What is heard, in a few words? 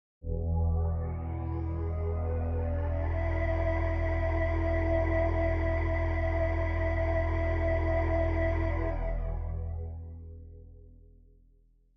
E Happy Pad Deep